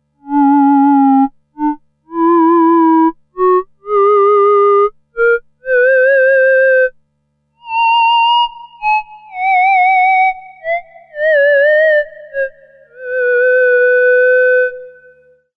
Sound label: theremin